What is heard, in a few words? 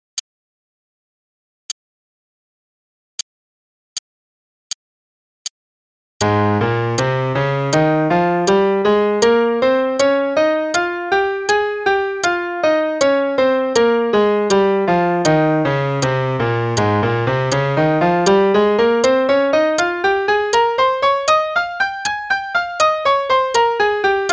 Ab Major Scale only (qn=80); 8th notes = 2 octaves, and triplets = 3 octaves; CM Level 5